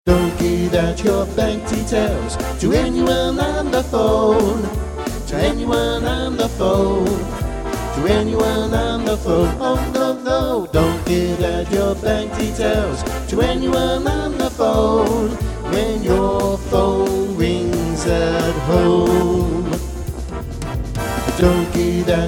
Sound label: ringtone for android